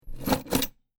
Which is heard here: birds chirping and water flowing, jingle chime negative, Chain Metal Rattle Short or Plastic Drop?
Chain Metal Rattle Short